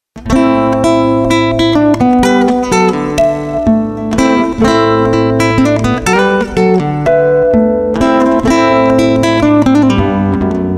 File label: instrumental